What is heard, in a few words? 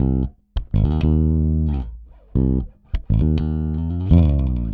Rock, Bass; Bass X